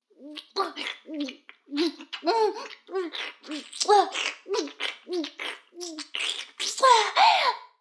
フェラ音声, ASMR; 逆時間停止されて

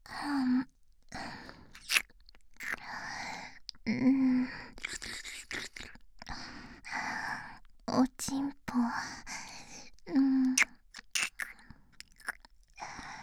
耳舐め

